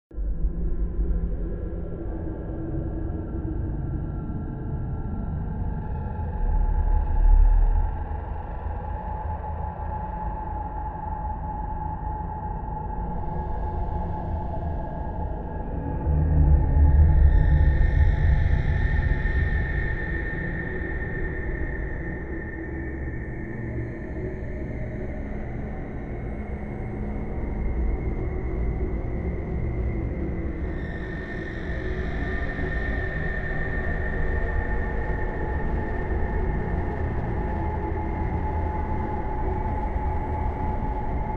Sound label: Ambient, Jungle; Foundry; FoundryVTT; sr5 Dawn Of The Artefact; Ambiance